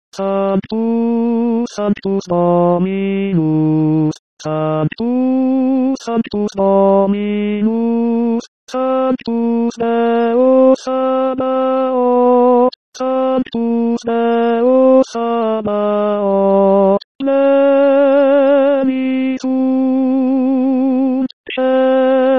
Santus tenors; Missa pro pace

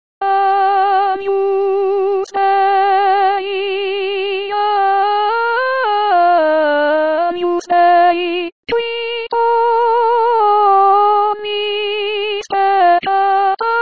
Agnus, Soprano; Missa pro pace

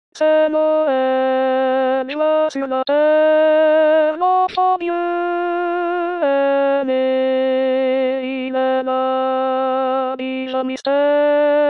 AMAZING GRACE, Alti

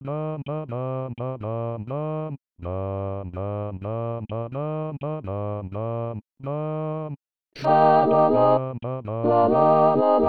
SSATB

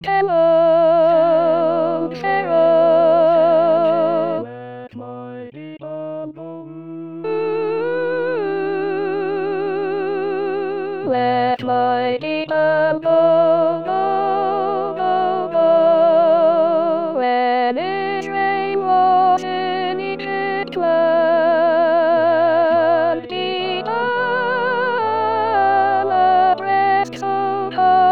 Go Down Moses Soprane

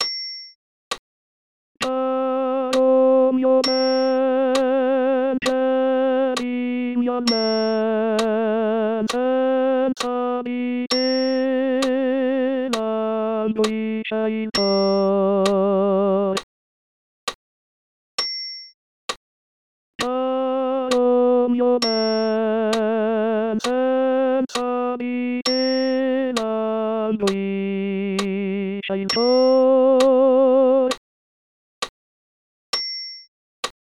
Caro Mio Ben Tenor

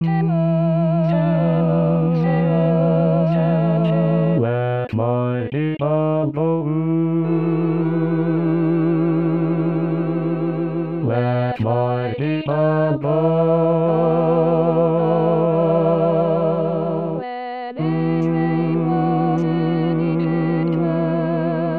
Go Down Moses Basse

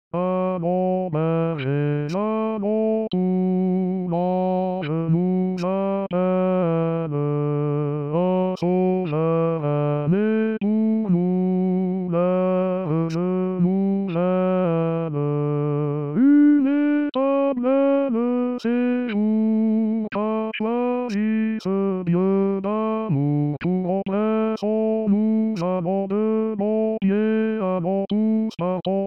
hommes

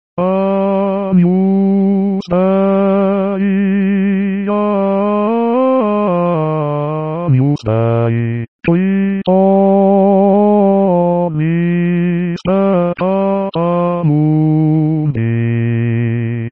Agnus basses; Missa pro pace

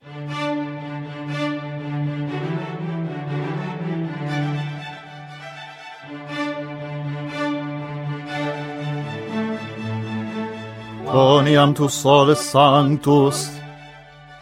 basse Quoniam tu solus sanctus

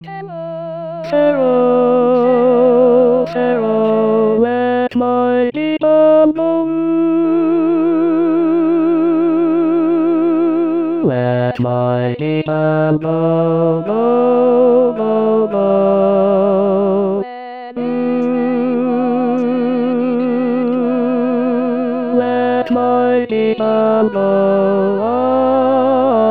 Ténor; Go Down Moses

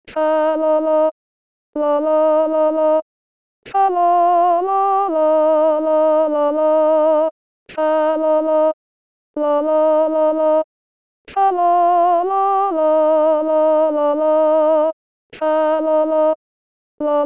Deck the Hall Alto

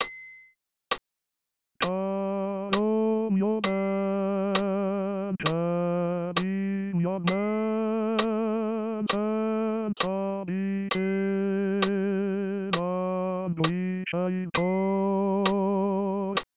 Caro Mio Ben Basse

